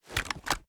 reload fast drum end, reloads; gmod

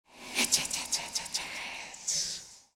eyelander idle; gmod; durgz; meth

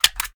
fast reload magout; gmod

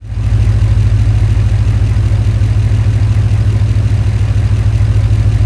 idle, vehicles; f350

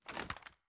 ads down, reloads; gmod

